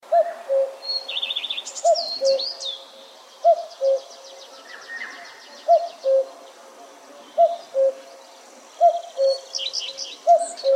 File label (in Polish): kukulka